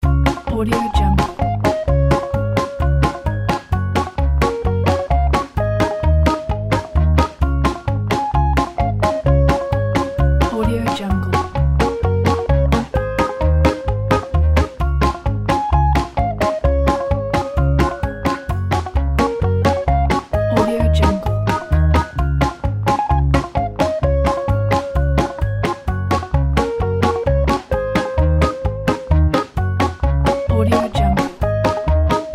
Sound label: happy background music